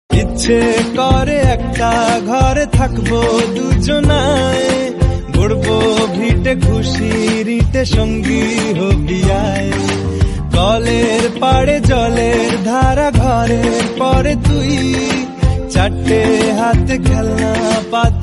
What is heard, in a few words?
bengali ringtone